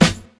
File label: hip hop snare drum sample a sharp key